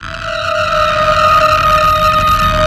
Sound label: light ray charge up; dogma